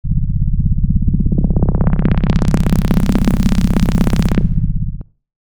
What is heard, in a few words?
Sub Fart rise